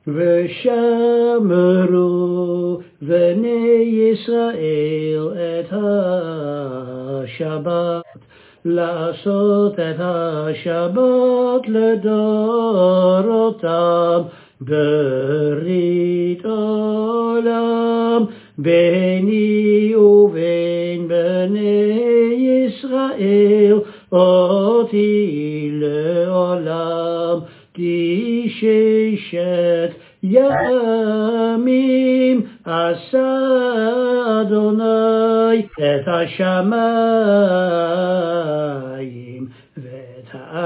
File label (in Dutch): zang en lezen melody; Wesjameroe